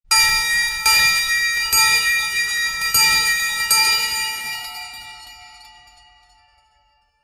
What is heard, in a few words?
recess bell, school